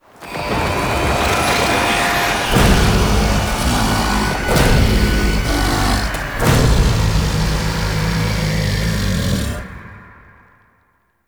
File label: Mirror Defense Alarm Waves; Warframe; Entrati